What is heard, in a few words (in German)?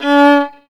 GEIGE; Best Service; Alpine Volksmusik